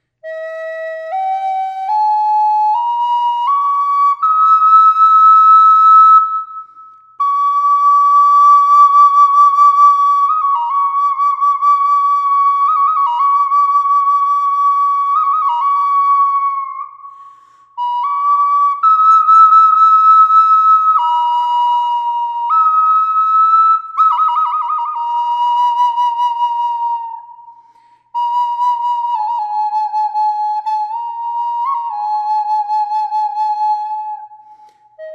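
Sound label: reverb, flute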